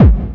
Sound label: Distortion Kicks, Bassdrums